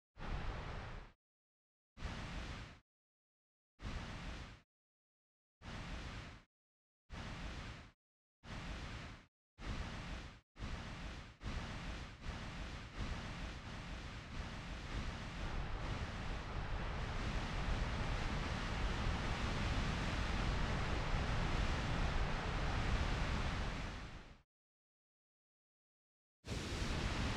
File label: granular synthesis, nature ambient; aulart